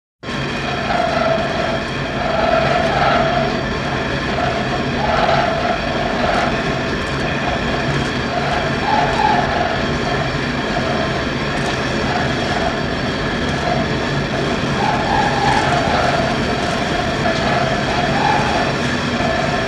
vetrjanoj generator

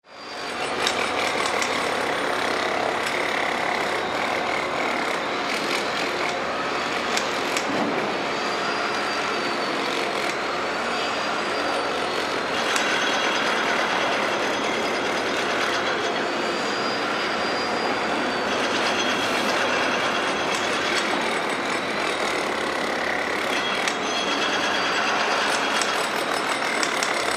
zvuk na strojke

